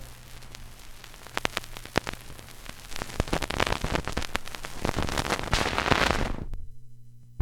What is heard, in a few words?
Record ending